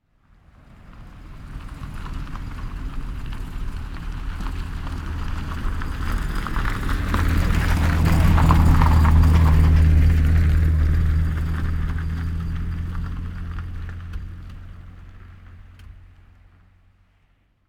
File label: car stones, street traffic; best service Blue Box